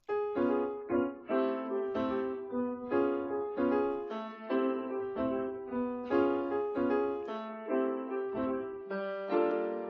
Other Instruments

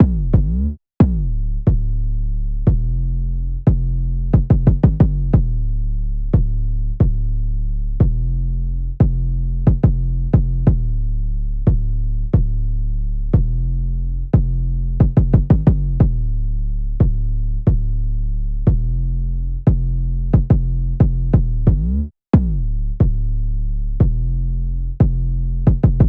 808 loop bounce 90; Kicks

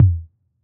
Tom

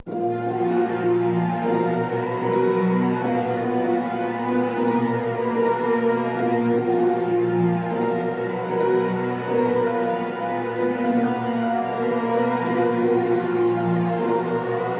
Holy Drill - 153 - D#m; Swarley

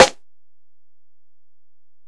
Snare

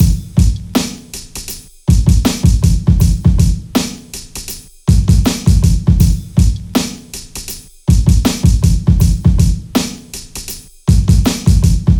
Drum Loop 80 bpm

